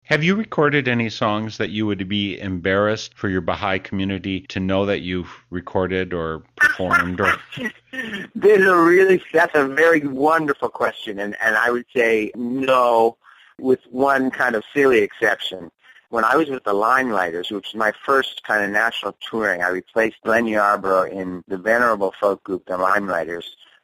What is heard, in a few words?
risque songs